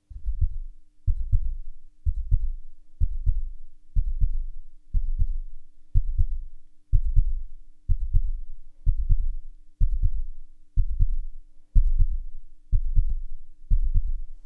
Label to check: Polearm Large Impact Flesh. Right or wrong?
Wrong